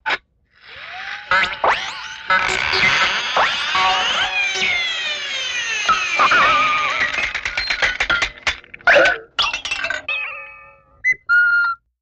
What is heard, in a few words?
Crazy clock, springs out of control